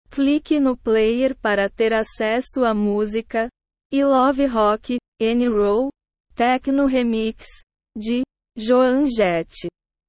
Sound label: techno remix